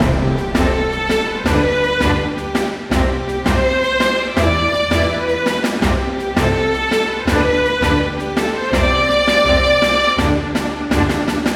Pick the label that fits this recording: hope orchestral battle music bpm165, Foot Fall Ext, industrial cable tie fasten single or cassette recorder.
hope orchestral battle music bpm165